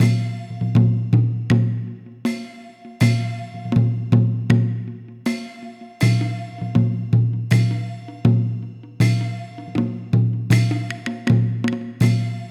PERCS 80BPM; INIS - Opium

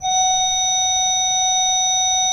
SWL F4, Cathedral Organ, SCHWELLWERK; Propeller Island

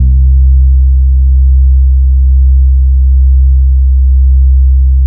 DEEP C4, Bass; Distored Reality 1&2

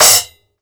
CHOKE, cymbals; Sampleheads - New York City Drumworks VOL-1; SW CYMBALS

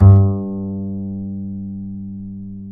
G 3, Bass; Spectrasonics; Bass Legends

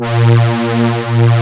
TRIDENT MKII, JAP SYNTH; East West - Global Sounds

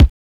mod kick, Mod Drums; Synths and FX; neuro; Sinistarr

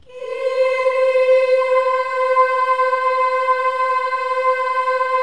KYRIE, Symphony of Voices; Spectrasonics; BC KYRIE

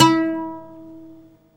OUD E 4

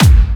Clubby Kicks, Bassdrums

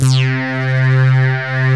303 stab; Pro-Rec - Synthsonic

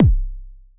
kick, Roland MC-909

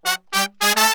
100 AM L, BRASS SALSA

